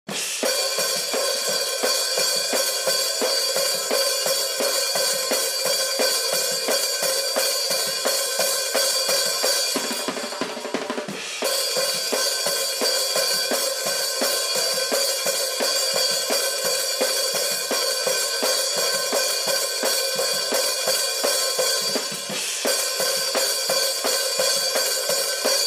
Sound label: hihat processed